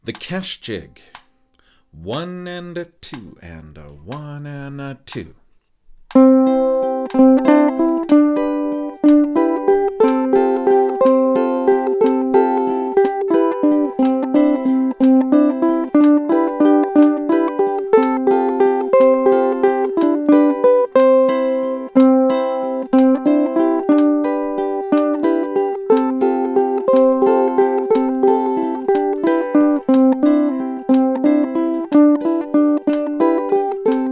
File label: The Kesh Jig, ukulele